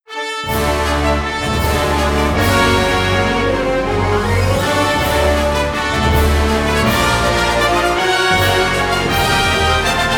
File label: Stinger